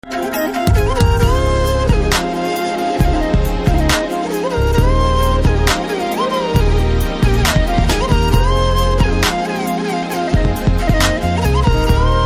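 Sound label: background music Ringtone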